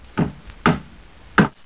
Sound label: knock; Sound Library 2000; Wayzata Technology; Household